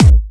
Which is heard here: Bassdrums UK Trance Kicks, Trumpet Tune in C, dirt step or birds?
Bassdrums UK Trance Kicks